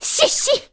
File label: Shh Shhh; Mila; Zelda